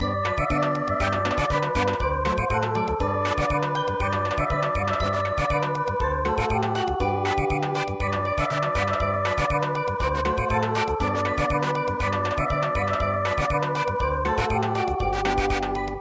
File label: Samba, traditional; Sunday English